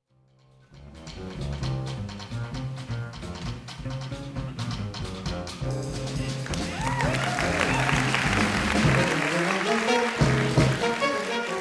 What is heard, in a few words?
vocal shout